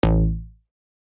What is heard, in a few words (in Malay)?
Kesan Bunyi Salah